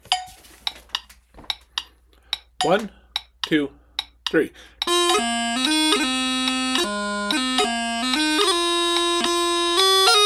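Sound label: 72bpm, Chanter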